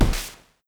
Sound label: punch lowbit heavy